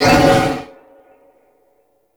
Wolf Attack; Animals; Duviri

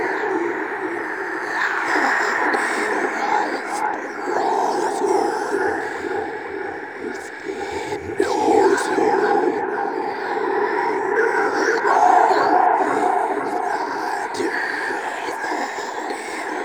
Whisper Loop; Warframe; Ambience; Entrati

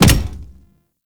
Step Default; Warframe; Corpus; Arachnoid Hunger; Steps

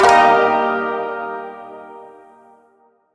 Shawzin Blues Chord; Warframe

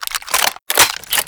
Reload; Warframe; Kraken

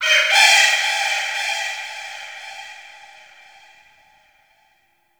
Forest Distant Bird Of Prey, Ambience; Warframe; Grineer

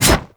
Melee Block Stop; Warframe; Wraith

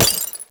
Glass Bullet Impact

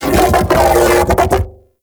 Mech Ambient Vocal; Warframe; Vehicles; Thano Mech

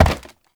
Step Wood; Warframe; Uriel; Movement; Steps

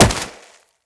Wall Jump Snow, Footsteps; Wall Run; Characters; Tenno

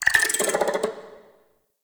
Interior Spider Clicking; Ambience; Warframe; Duviri; Murmur

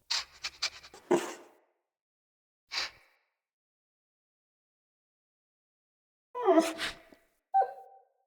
Wolf Cub UI Menu Idle; Vocals; Animals

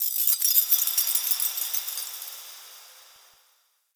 Moon Chimes Small E; Ambience; Warframe; Orokin Moon

